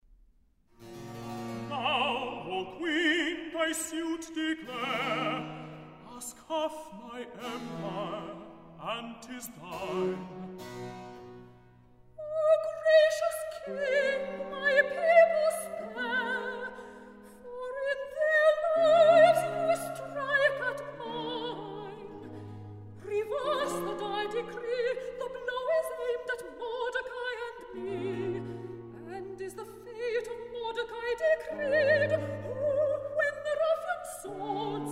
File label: Recitative Now O Queen Thy Suit Declare; Handel; Esther; HWV 50A